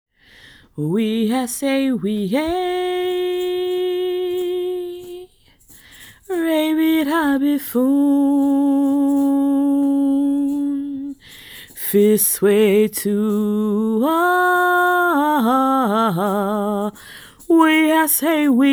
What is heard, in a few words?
Chant; Release Fear